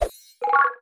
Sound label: notice prop hint